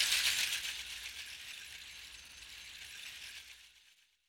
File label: Chimes Loop Release, shakers